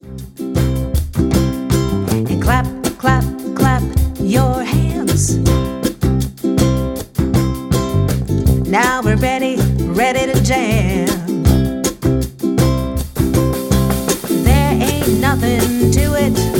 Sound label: rhythm